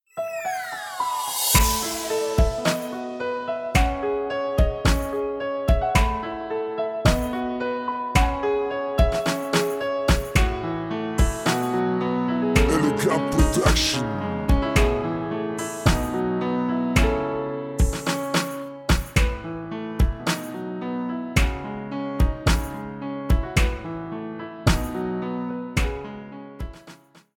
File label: RnB